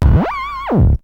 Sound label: EL. ANIMAL, Techno Trance; E-MU Formula 4000 Series Vol. 2